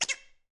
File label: quack